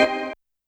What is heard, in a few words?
Organ Stab H, YAMAHA RM1X